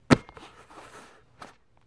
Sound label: Football Handling